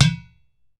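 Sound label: UDU FINGER, African Perc; E-MU Formula 4000 Series Vol. 4; Earth Tones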